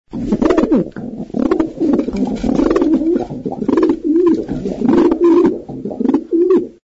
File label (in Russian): vorkovanie sizogo golubya